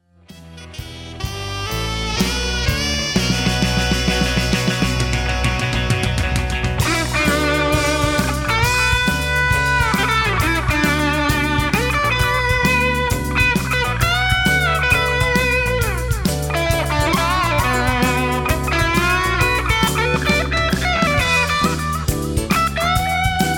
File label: Helliphant Money Solo with Carbon Copy Delay; Stomp Under Foot Hellephant fuzz; Fender strat with SSL-5 bridge pickup; MXR Carbon Copy analog delay, and Fender Twin reverb